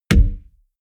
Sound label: window punch deep